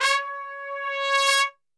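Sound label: C#4 trumpet, Brass Super Section; Best Service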